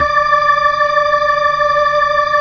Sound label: B-3, Full Xfade